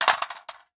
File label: weapon impact soft, metal; physics